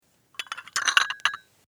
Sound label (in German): Tasse auf Untertasse